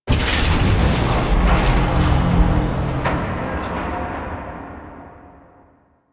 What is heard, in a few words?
Void Dragon Move Long; Warframe; Duviri